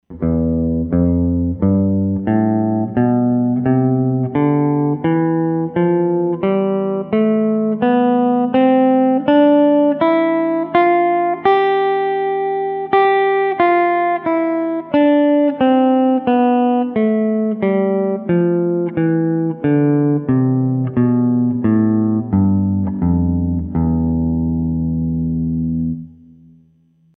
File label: Natural Notes Up Down, guitar; introductory guitar skills worksheets